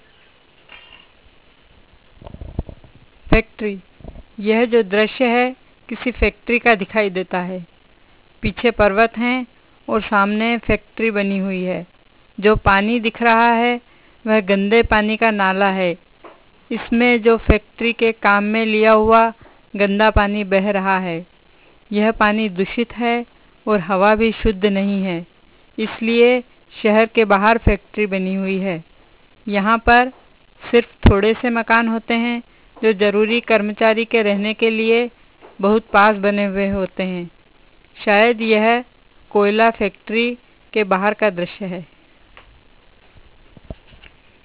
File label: factory sound, India